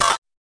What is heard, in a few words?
fx drum, Electro FX